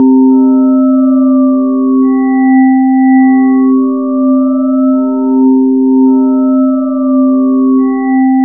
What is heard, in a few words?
INHARMONIC; Zero-G Ambient 1